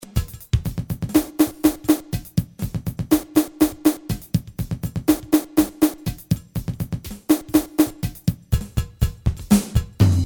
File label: drums; sliced